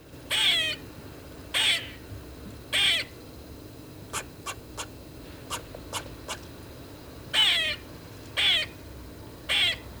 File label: eastern grey squirrel